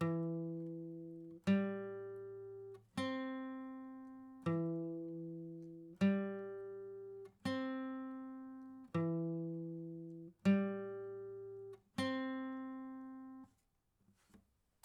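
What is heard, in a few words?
acoustic pattern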